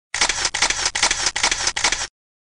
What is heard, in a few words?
camera click sound effect